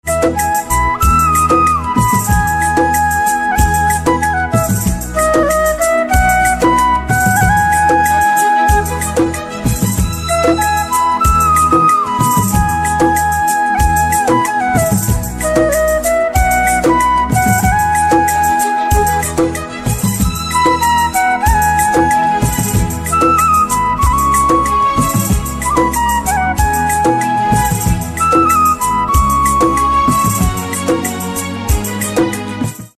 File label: Flute